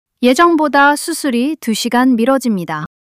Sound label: surprise